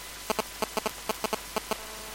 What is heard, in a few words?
GSM buzz